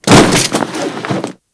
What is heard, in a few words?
Grineer Body Roll; Warframe